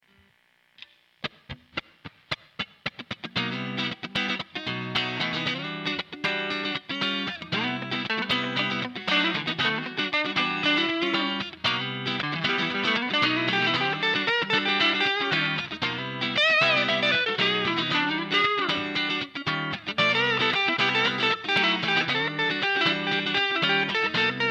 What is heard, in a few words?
cut solo